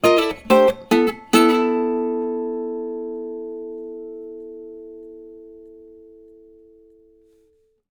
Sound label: licks, Blues Guitar; ILIO; Fretworks